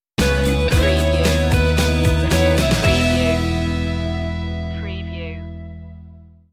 easy summer stinger

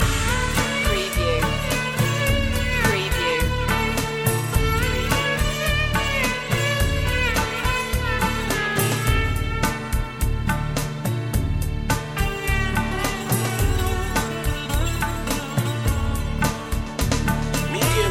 bombay blues loop

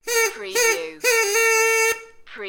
Party Horn Riff

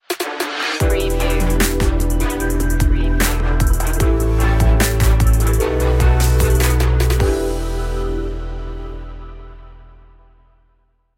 cool trap stinger

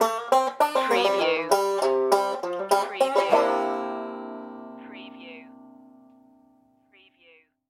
stomp stinger

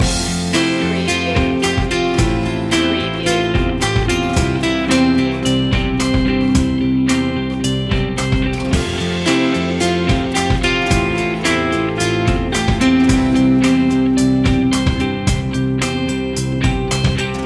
quiet determination loop

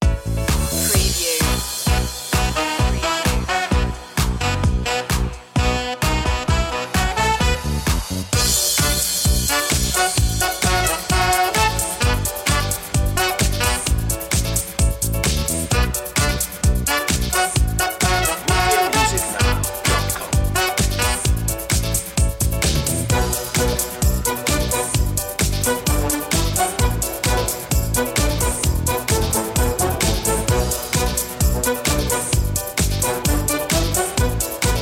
funk jazz; media music now

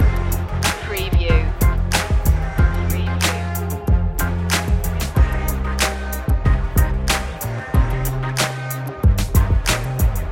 dark secrets loop

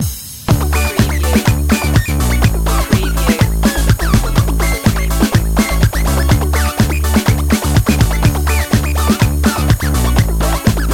techno chicken

